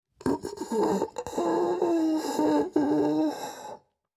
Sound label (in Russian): zvuk smerti